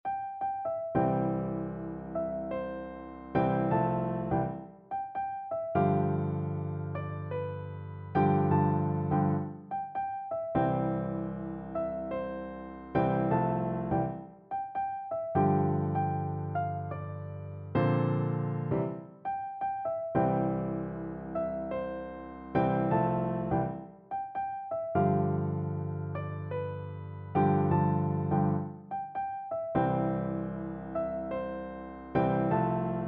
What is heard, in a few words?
whole world, piano duet